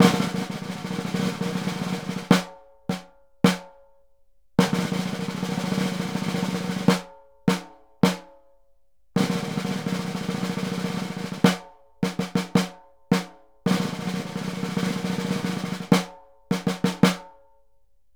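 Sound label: snare, Synclavier Percussion; Synclavier Percussion 2; World & Orchestral; ILIO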